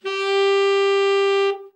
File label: tenor soft, sax; Giga Samples Collection